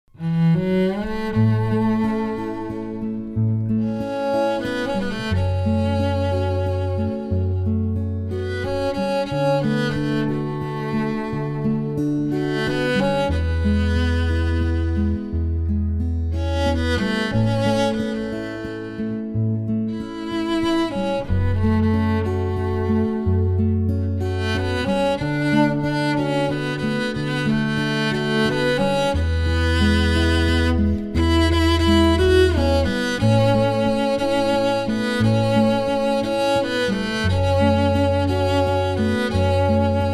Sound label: cello guitar